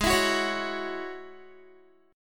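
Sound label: A flat 7th Suspended 4th Sharp 5th A flat Guitar, chord; strummed; 16,19,16,18,18,x; 4E,4A,5D,5G,5C,6F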